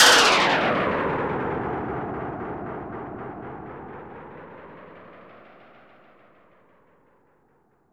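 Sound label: drop snare, perc fx; Zero G; Malice In Wonderland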